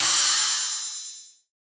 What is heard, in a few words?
crash, cymbals, drum one shots